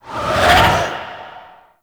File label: Ship Cannon Whiz By, Flak Cannon; Warframe; Railjack; RailJack Weapons; Ghost Ship